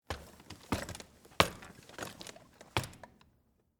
Wood Ladder Up, Walk, Up, Fast, Interior, Perspective; BlastWave